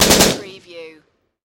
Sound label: Machine Gun Sig SG5